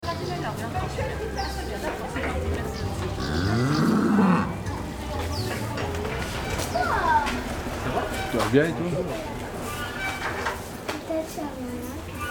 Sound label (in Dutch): sfeer hondschoote